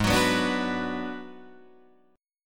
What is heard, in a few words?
Ab Minor 7th Flat 5th Ab, down, Guitar, Drop D